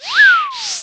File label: box twirling